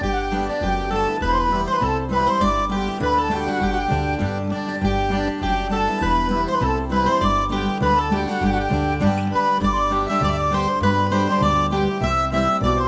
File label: Town Tavern; sid meier s pirates 2004 pc